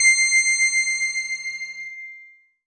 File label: VS BELLI; Synclavier Sampler Library; KEYS AND GUITARS